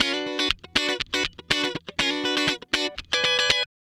Guitar Licks

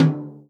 tom, Real Drum Kit, jungle kit

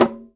GENDANG, GENDANG DRUM; Spectrasonics; Heart of Asia

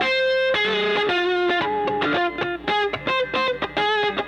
Raw Funk Guitar; Sonic Foundry (Sony Creative Software); What it Is! 70s Analog Funk

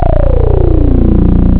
bridge bass; jambala; Amiga Soundtracker Sample Packs

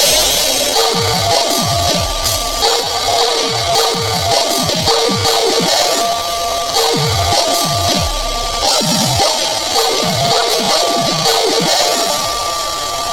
Lo Fi, Lounge Drumz

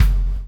CHAIN; Spectrasonics - Backbeat

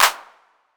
808 CLP; Wizoo - Platinum 24 Electronic; 808 SOFT P2

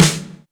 SNR; THE CONSTANT; Back In Time Records - Big Bang

